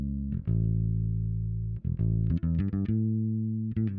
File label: bass; musdb18